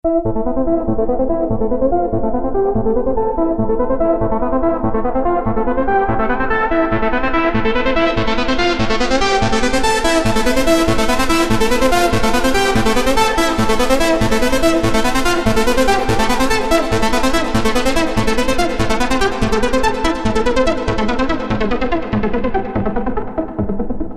e-phonic invader vsti sounds external fx

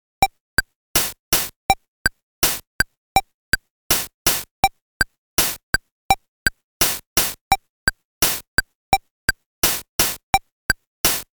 casio vl-1 vl-tone sounds, tempo

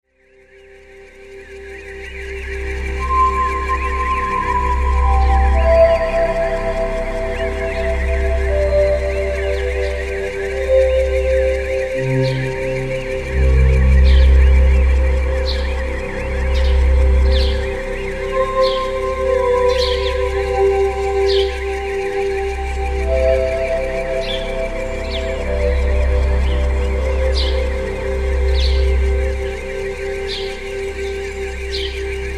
kawai gmega birds external fx

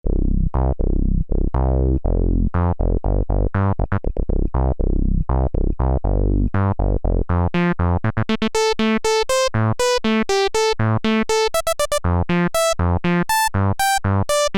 Alesis Micron filter, lpf 4 pole q075

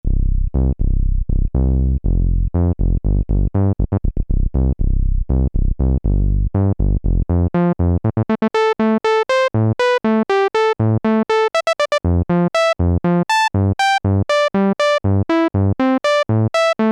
Alesis Micron filter, lpf 4 pole q000

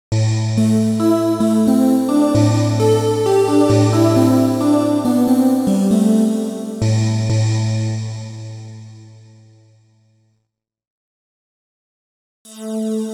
yamaha ex5r sounds, fdsp pwm, choir raw and pwm

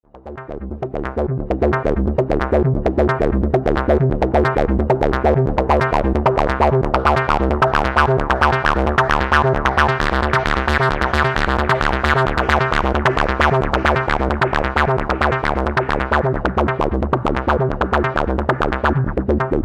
korg poly 61 303 external fx; TB: Korg Poly-61, FX by Yamaha FX900

